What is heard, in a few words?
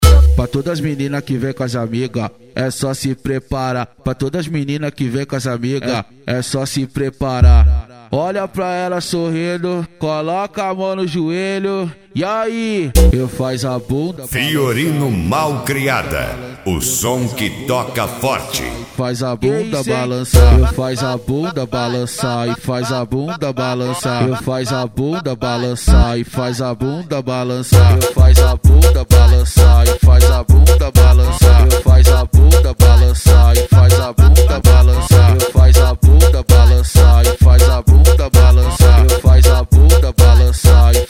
mega funk bass